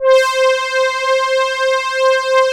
Elka Synthex Brass

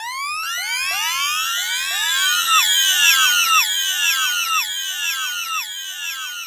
SFX, 180 BPM, Drum & Bass

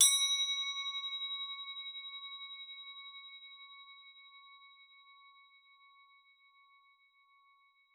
CROTALES C#4; Xsample VOL-4

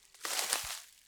Rustly Step, Footsteps, outdoor

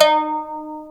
koto D3, ethnic; AKAI S-Series CD-ROM Sound Library VOL-8

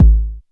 K909, KICKS TR 9+8

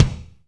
Reverb Kick Snare; PS-37 Dance Synths; Percussion

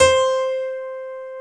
PNO, SY PIANO, House; Best Service ProSamples vol.10; AKAI

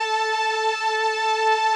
505, String Machines

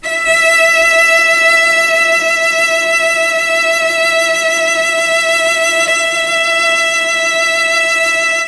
E4 CELLO, Mellotron; CHMBLN CELLO; Mike Pinder - Mellotron Archive CD

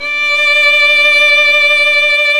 STR VL D, SOLO SECTION; Roland - LA Composer vol. 1

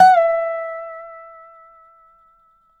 dobro; DOBRO DOWN; Country

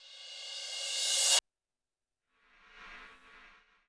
Roland TD-20 Percussion

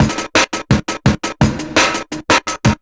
More Acid Beat, Drum N Bass; Sonic Foundry (Sony Creative Software); Knutrix Drum & Bass

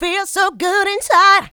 Female Vocals; Houseworx

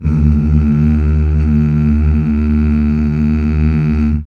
MMH; HAL; Back. Mmh Mal; BACK. MMMHH

